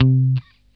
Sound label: GUI C3, E-GUI MUTED; Sound & Vision; Gigapack I CD 2